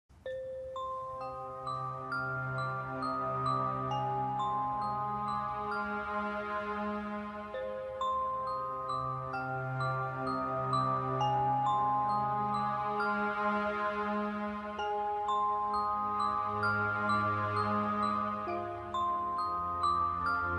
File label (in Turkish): Enstrümantal fon müzik; Kadın